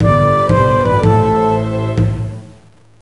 Renaissance Lose; Mystery Tales: Time Travel; NDS

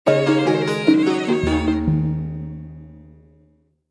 China Music Short; Civilization Revolution; Xbox

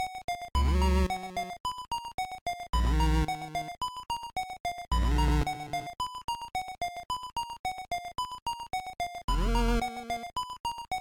BGM Epilogue 8bit; Mighty No. 9; 8-bit soundtrack

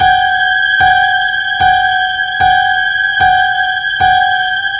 Killer Stinger Warning

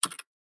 Click Button

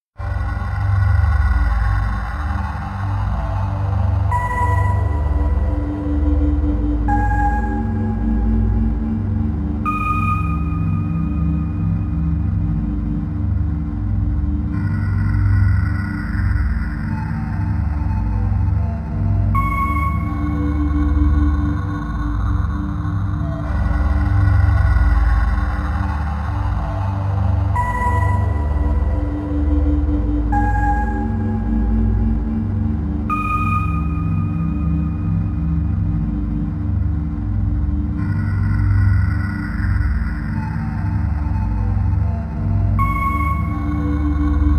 Ambiance

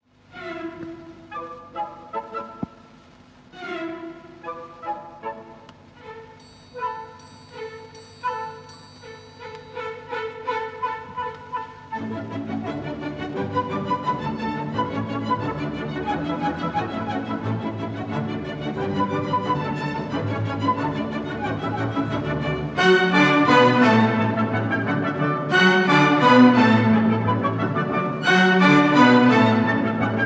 Can-Can Gallop; Jim is Moving Out; Offenbach

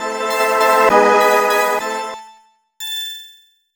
Jingle Game Over; Zombiez Seeker; NDS

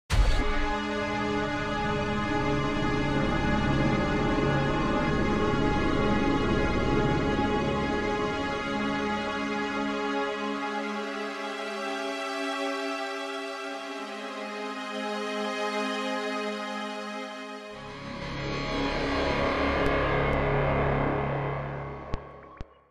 Streets Death; NYC; Deus Ex Game of the Year Edition

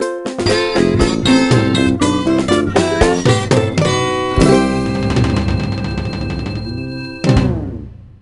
Jingle Best; tamagotchi connection corner shop 2 ds; Kuchi

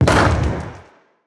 Skill Impact; Mother Nature

